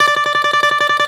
BALALAIKA, Prima Balalaika, Plucked Strings

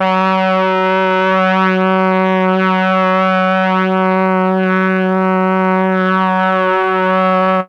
MEL BRASS; ILIO; Propeller Island; Legendary M400

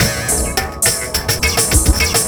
Beats, Synchro Funk; Shadow; Sonic Foundry (Sony Creative Software)

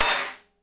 HAT SNR; Spectrasonics; Burning Grooves

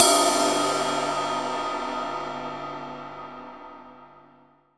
RIDE, Drum Dry; East Collexion; Drum 1 Dry

